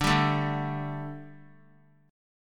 D Suspended 4th D; strummed

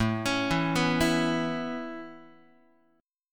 A-Major Add 9th Guitar, Aadd9 chord; Aadd9 arpeggiated; JGuitar

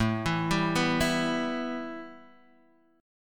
B 7th Suspended 4th Sharp 5th, A; B7sus4#5/A Chord {X 0 2 0 0 0} arpeggiated

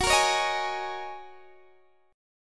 Gb 6th Add 9th Gb down Guitar DADGAD

